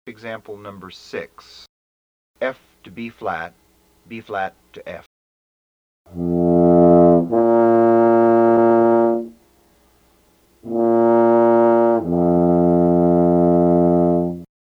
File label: Emb TUBA